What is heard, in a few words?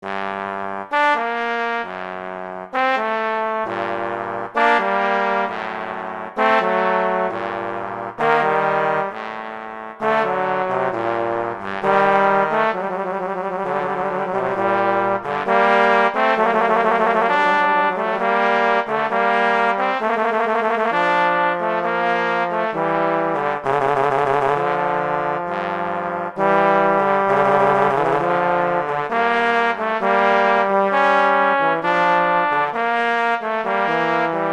Adagio; Telemann; Canonic; Sonata 1